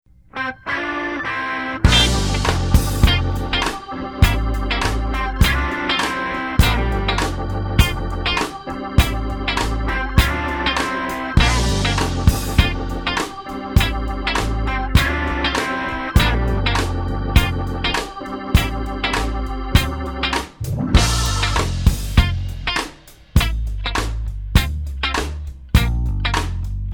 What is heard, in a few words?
funk rhythm